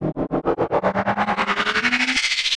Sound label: charge up; cameramen pack 2; Cameraman2 BIG